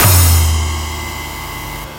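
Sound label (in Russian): звуковые эффекты воспроизведённые на шумных старых перкуссионных семплах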